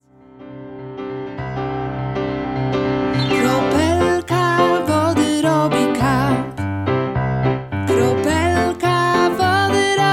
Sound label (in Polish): wersja wokalna